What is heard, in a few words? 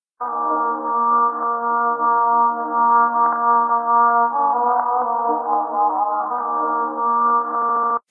spike amb intro; svencoop; sence; source of life